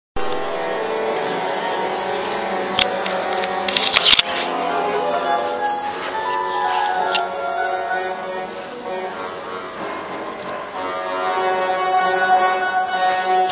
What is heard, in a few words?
harpsichord